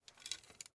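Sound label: arrow rattle; smite; medusa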